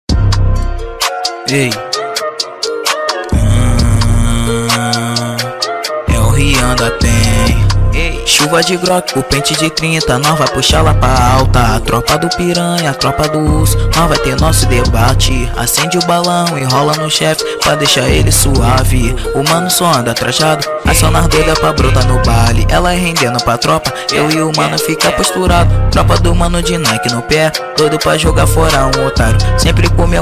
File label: trap